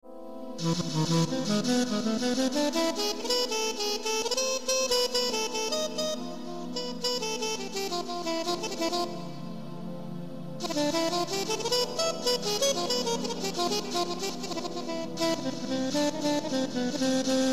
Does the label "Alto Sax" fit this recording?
Yes